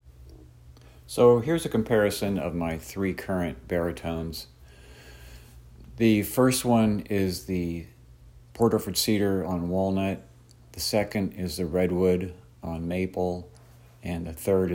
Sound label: 3 baritones